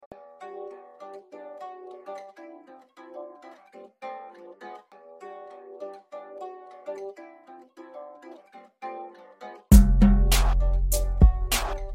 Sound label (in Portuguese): samba